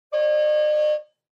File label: Car Horn Fake